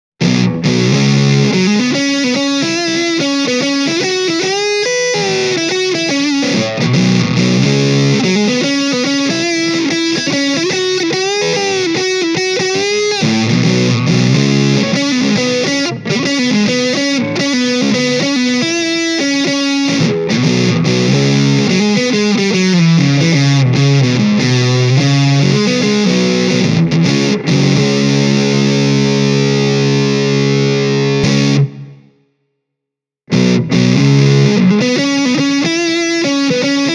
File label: boss bd 2w full gain; Boss Waza Craft